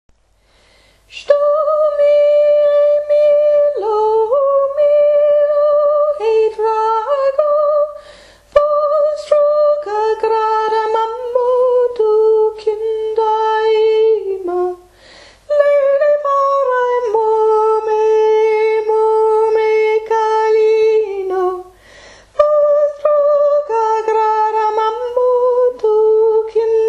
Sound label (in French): voix hommes chantée haute